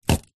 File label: food easter egg in foil wrapper down on surface; zapsplat